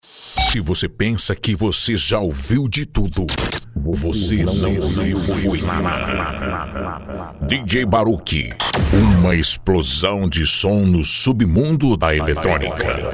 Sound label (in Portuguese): vinheta